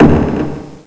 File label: hammer